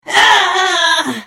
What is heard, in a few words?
Hit; The Elder Scrolls III - Morrowind